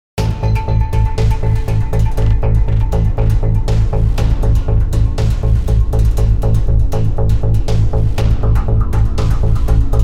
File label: DRIVING DRONE